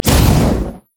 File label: mana flare